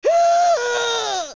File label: Cursed Spider Scream; zelda; OOT